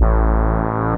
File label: dance, bass